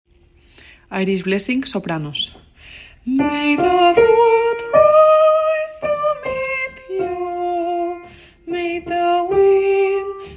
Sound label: irish blessing sop